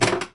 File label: Pinball Kickout Ball